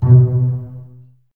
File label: BSS B 2 Pizz, String Ensembles Basses; Miroslav Vitous